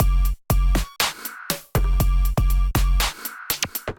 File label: Kit, Drums, Hybrid; Timefold Kit; Ableton; Factory Packs; Drum Essentials